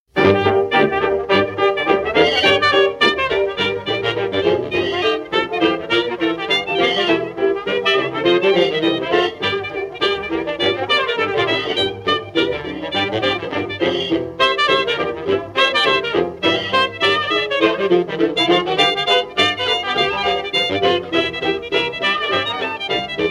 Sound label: Jazz Band